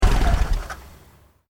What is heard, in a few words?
Engine: Stop Engine, car; Cars; Vehicles; Car Sound Effects; Cars (Sound Effects:Vehicles); Stuart Duffield